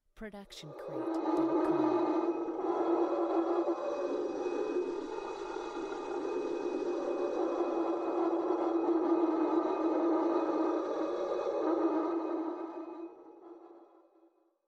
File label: Distorted Haunting Voices; SoundsCrate